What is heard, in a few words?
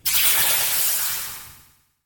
scifi attack